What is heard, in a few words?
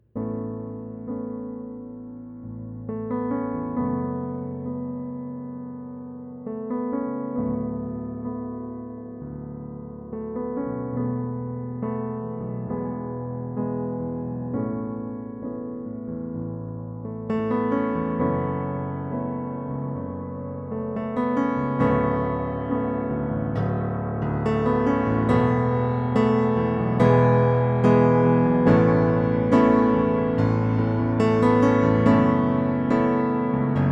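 U4 Powerful Pop, Pianoteq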